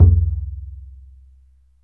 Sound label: MALAY DRUMS; Spectrasonics - Heart of Asia CD2